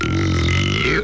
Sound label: burp; gearbox